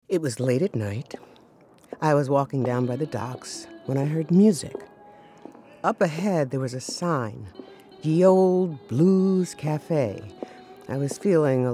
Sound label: Pirate Blues